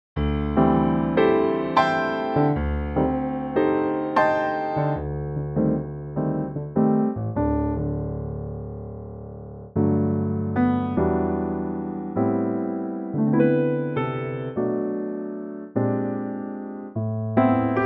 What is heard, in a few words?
G piano